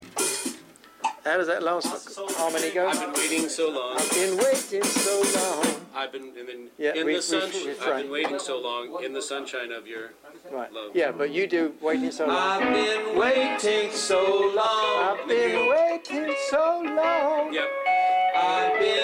Background Chatter Mix

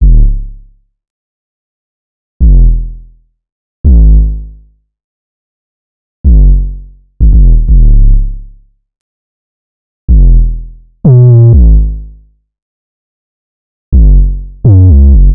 Synth loop 125 G#m, Synth Bass Loops; Slate Digital BASSHOLE Sample Pack

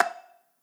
clap one shot, Pop drums; Slate; SD PASADENA Pop Sample Pack

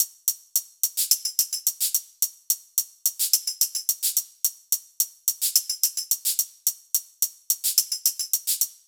hat loop 108, Drums; Pop; Hat Loops; highland; Slate; SD PASADENA Pop Sample Pack

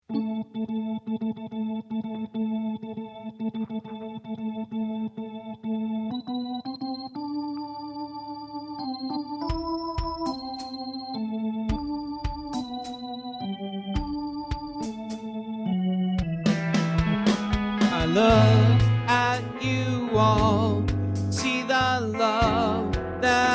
vocals

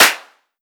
Clap Big Thick, 909; 909 Claps; Slate; Vintage Drum Machines Volume 3 909 Edition

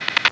perc flaw; Drums; One Shots; Percs; Slate; SD AURORA Sample Pack

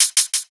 hat outside, Closed Hat; Drum One Shots; SD MIDNIGHT Sample Pack; Slate

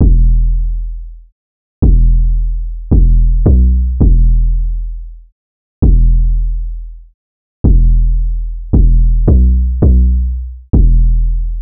808 loop 165 E; Slate Digital BASSHOLE Sample Pack

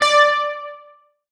tonal oneshot sting D; Pop; Slate; PASADENA Pop Sample Pack

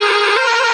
vocal australis Ab; Slate; AURORA Sample Pack; Vocals

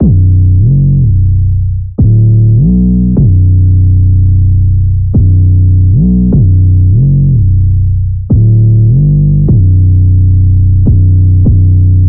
808 loop 152 Bm; Slate Digital BASSHOLE Sample Pack

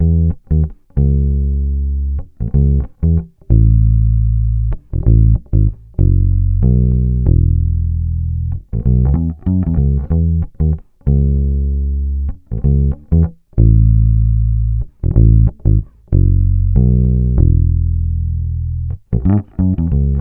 Bass loop Whale 95 E, Electric Bass Loops Direct; Slate Digital BASSHOLE Sample Pack

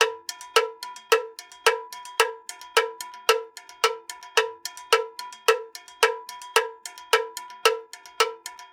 cowbell loop, 110

